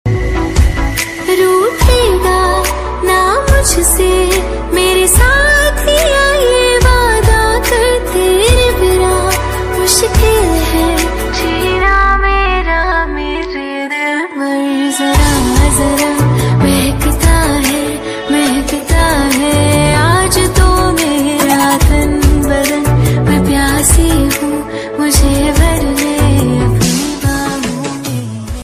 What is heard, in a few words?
Female Version Song Ringtone